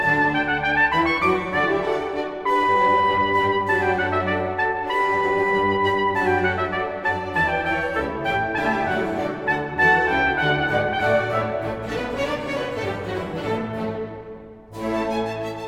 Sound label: Trumpet Concerto in D Major Allegro moderato, London Philharmonic Orchestra